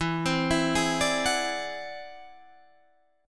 E Augmented 9th E down Guitar Open D

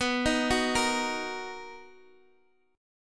B Major 7th, Guitar Open D chord

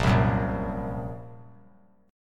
A sharp Suspended 2nd Sharp 5th A sharp; A#sus2#5 strummed; JGuitar

